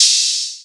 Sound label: Open Hat, Cymbals